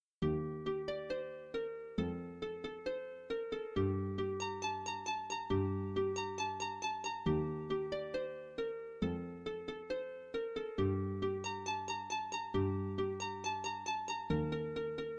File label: Noteblock